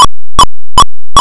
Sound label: bell; Hungry Horace; These sound effects are produced while the title screen is on display